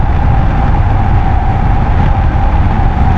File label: windrush in, Kart